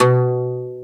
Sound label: Oud B1; Planet Earth; Digital Sound Factory Planet Earth